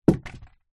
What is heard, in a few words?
household trash can small plastic drop or knock over